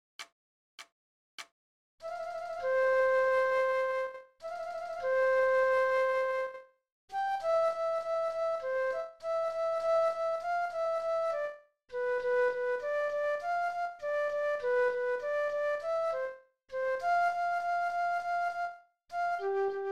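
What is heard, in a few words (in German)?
Floete, 100 bpm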